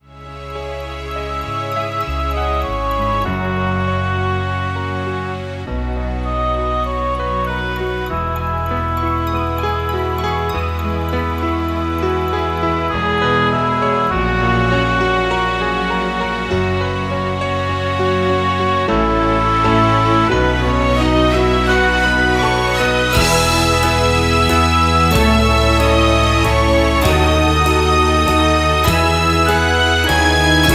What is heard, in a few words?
Instrumental soundtrack